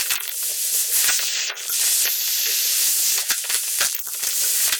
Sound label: Cracklature; sparse soundscape; Loops